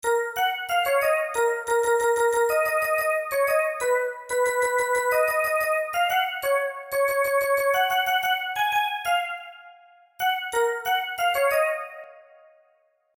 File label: Ringtone In Minor Key